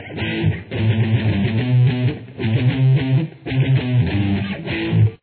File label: guitar